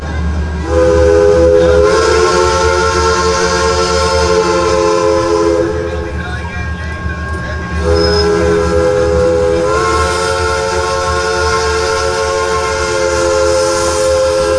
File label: horn